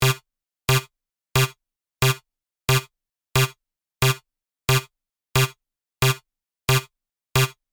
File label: mono note default, sequencer; mono note module; Numerology; Five12